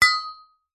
foley metal tubes small short fat clunk together single